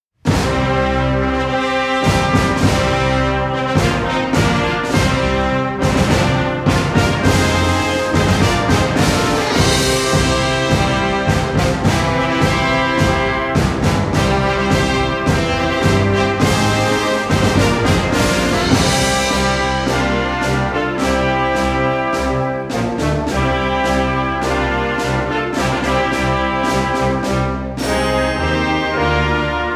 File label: Fanfare & Procession